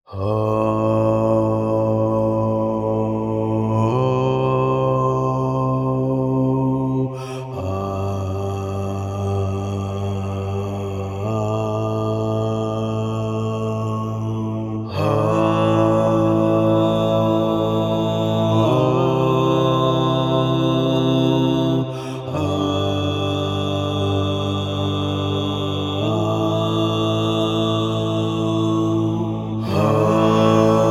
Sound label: Suspense Acapella Motions